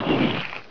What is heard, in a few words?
Slide Up; nile; senet